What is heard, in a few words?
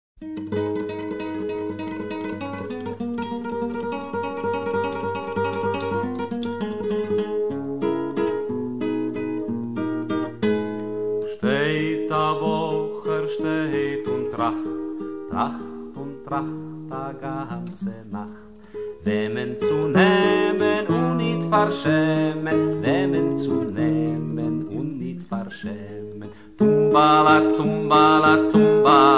Yiddish Folk Song